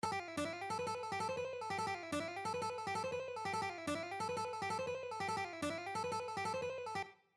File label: Legato Guitar Lick